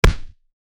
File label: realistic punch